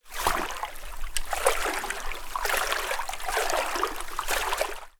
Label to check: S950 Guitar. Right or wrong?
Wrong